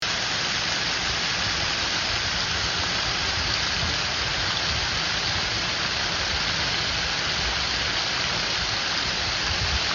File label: waterfall sound